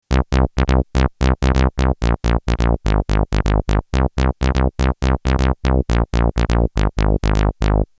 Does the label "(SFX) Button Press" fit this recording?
No